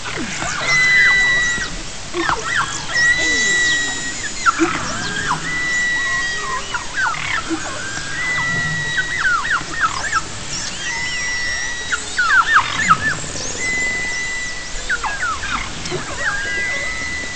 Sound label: Sounds of passing whales and other marine mammals